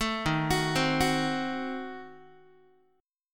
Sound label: Eb Diminished 7th Eb down Guitar; Ebdim7 arpeggiated; 12,11,9,10,10,x; 4A,4E,5A,5D,5G#,6C#; JGuitar